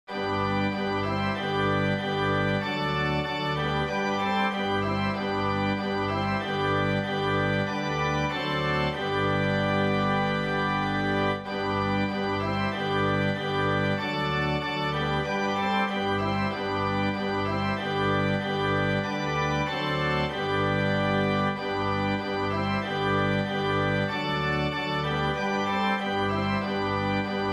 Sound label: organ; Brethren We Have Met to Worship